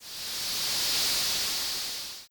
rain quiet

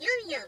fly; sfx; yumyum

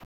right foot

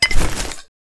pickup item

